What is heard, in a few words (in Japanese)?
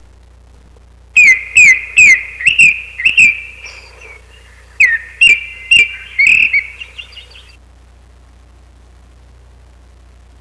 kurotugumi